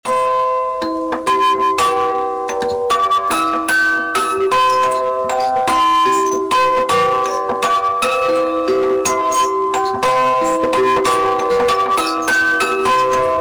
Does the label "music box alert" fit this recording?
Yes